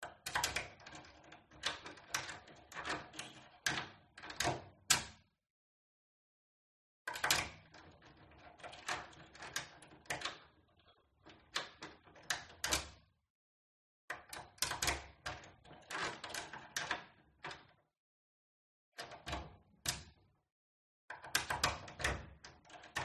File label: LOCK Front Door Various Patterns Distant Perspective